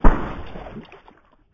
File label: punch